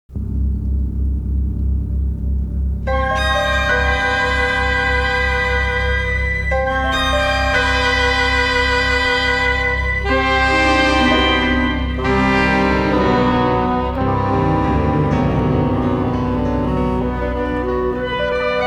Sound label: Chamber Orchestra